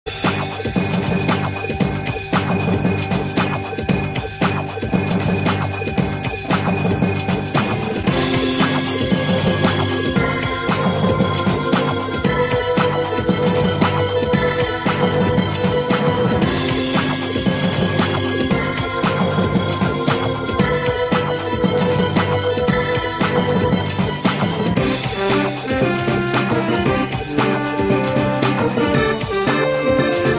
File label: Airship Theme Coin Rush; SMR